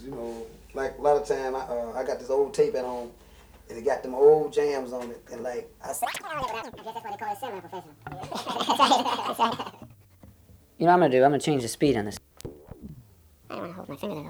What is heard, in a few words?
dying battery